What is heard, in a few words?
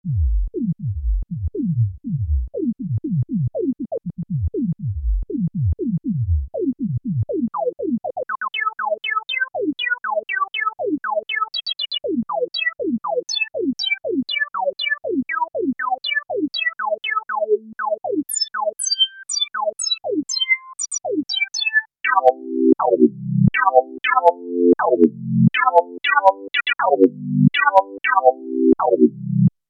access virus c filter lpf12 + lpf12 ser q100